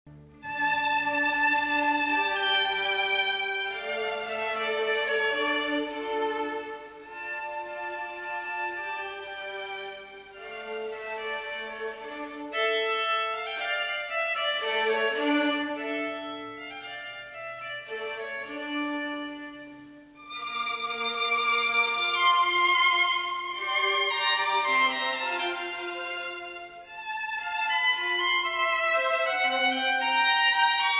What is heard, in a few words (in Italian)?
violino; tartini